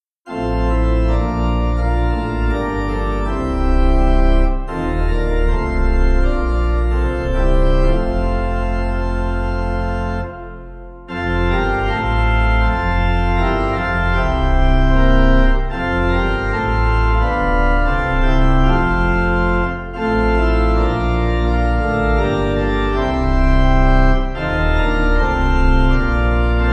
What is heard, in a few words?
Lamb Of God Gentle Jesus Pipe